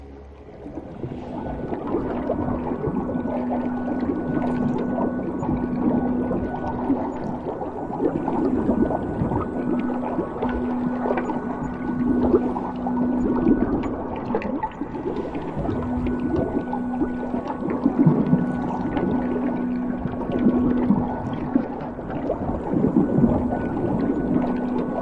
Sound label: Underwater Lifeforms; SFXtools